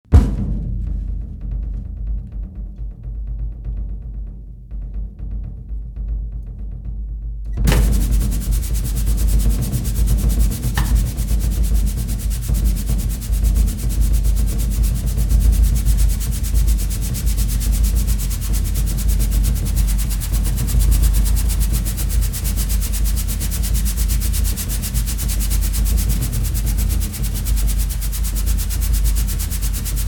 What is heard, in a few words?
sound of drums